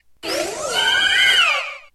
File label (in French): Cri forges